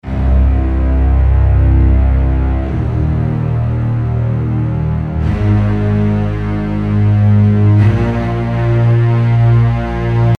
g minor cello and double bass orchestral build up loop 93 bpm